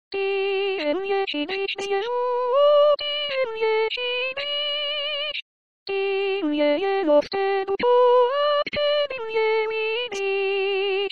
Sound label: Soprano